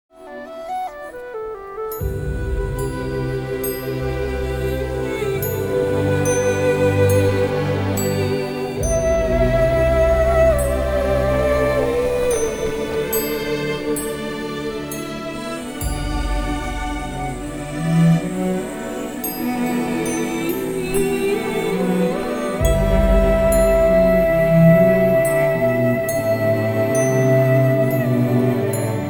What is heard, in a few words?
Theme Music; Anbe Aruyire (2005)